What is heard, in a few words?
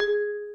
Bell G#4; osu